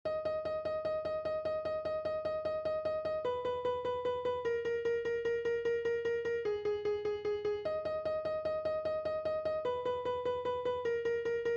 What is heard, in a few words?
Guitar